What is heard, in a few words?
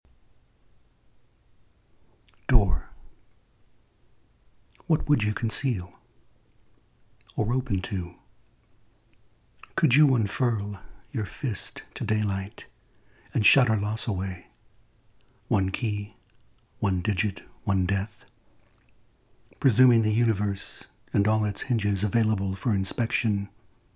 door w squeak